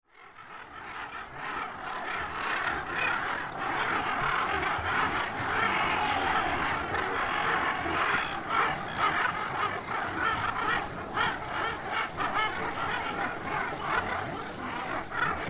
Water Foul At Feeding time, Wetland Centre, Many Various Birds; Many Various Birds On Water; Water Foul At Feeding time 1- Wetland Centre - Many Various Birds On Water